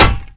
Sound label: hammer hit